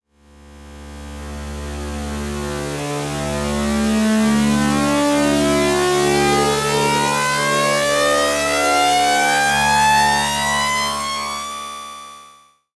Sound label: synth texture; bluezone; sonniss gdc